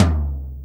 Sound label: DEEP TOM, SUPER FUNK; Pro-Rec - Synthsonic